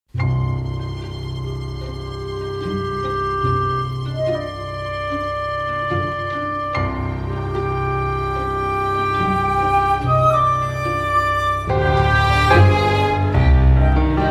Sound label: Piano